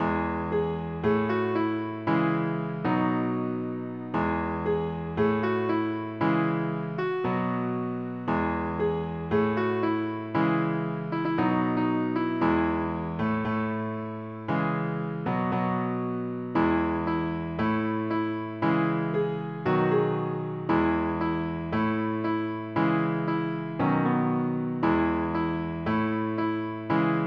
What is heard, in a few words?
piano; PSE Music; normal tempo